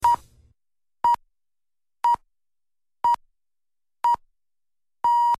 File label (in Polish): Radiowy sygnal czasu